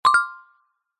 multimedia notification bright metal tuned mallet generic; zapsplat